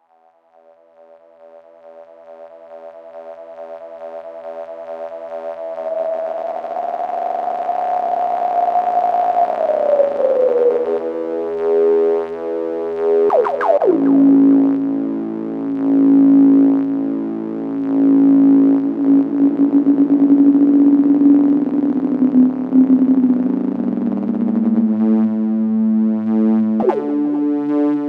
Solaris Ring Mod Obie BP filter

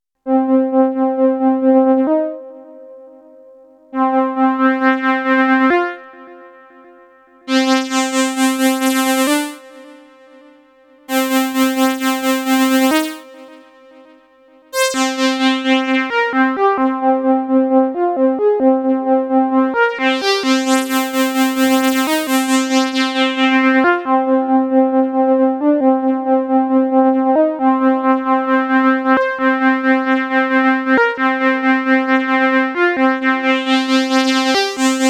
MS20 live

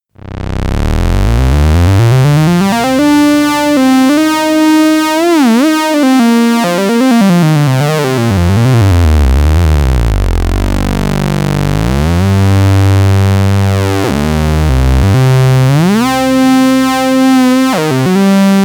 Boomstar4075 Solo

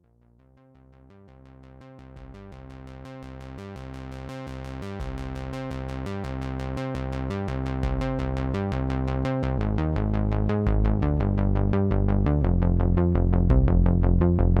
PWM Prophet5; RetroWave

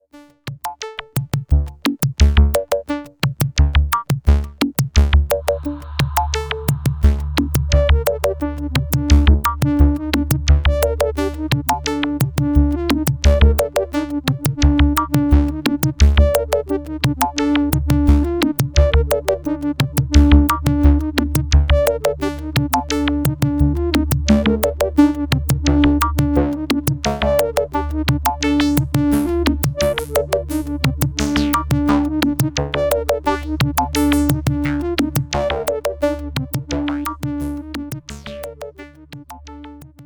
sh5 Mix

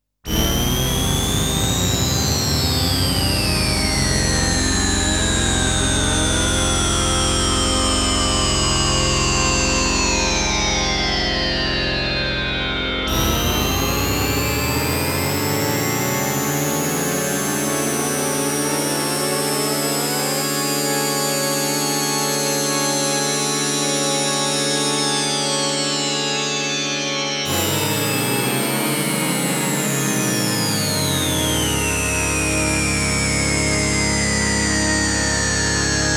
Oberheim OB8 Portamento

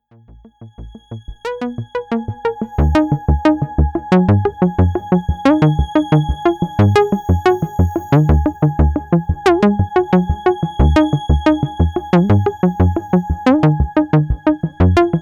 Juno-60 JD-800

